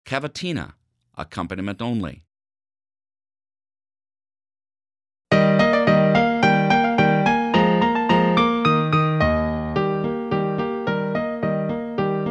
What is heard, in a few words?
trumpet piano only